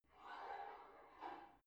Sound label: barbell heavy plate pull out slow reverby; sport freizeit; fitnessstudio